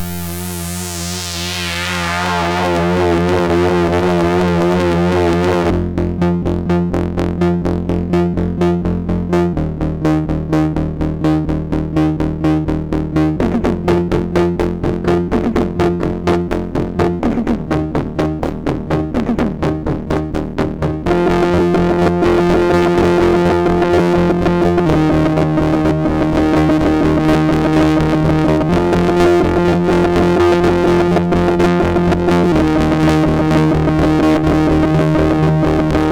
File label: Rock Tune, MOS6581R4; The Dream Maker; 3D Rock Tune